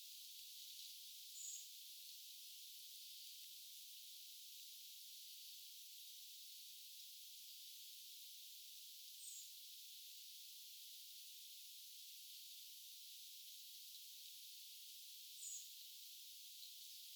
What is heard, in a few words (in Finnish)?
olisiko mahd sinihohtorastaan hiljaisempia aania